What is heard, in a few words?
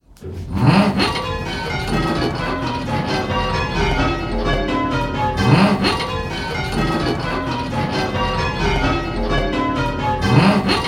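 Bells ringing; Pebworth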